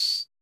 perc, Roland MC-909

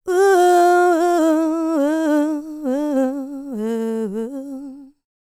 LICKS, Vocal Planet, R&B; ILIO; Dance; LT R+B

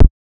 KICK moan

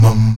VOX MUM A 2C, Afro Chants; Roland LCDP11 Africa VOL-1; Stacc Mums

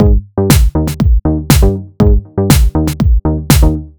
120 No Perc; Zero-G; Soundclash Nu Dance Electro; Acidized Wavs; Combi Loops; 120bpm

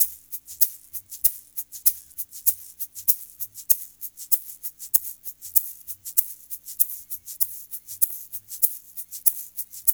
SHAKER, Funky Ass Loops; East West

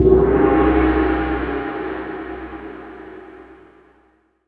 TAM TAM; ORCHPER

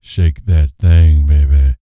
Vocal Planet R&B Dance BASS CATCH

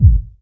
hardbassdrumz, breakcore; Bazzdrumz

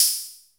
HAT, DRUMS; Music Store Sound Service; Einstein

